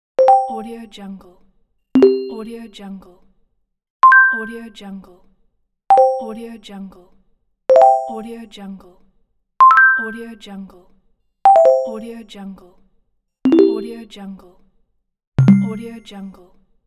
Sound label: notification